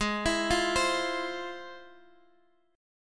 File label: F Minor Major 7th, G sharp